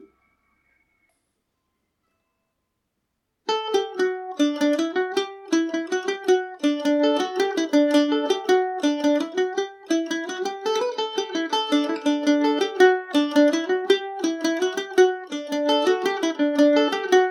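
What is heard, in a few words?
D mixolydian, Irish mandolin; queen of the rushes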